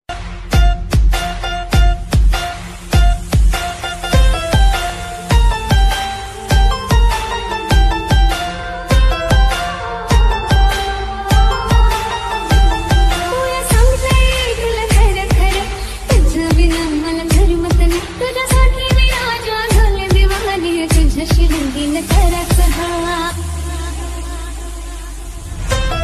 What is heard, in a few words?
marathi ringtone, marathi ringing tone, instrument ringtone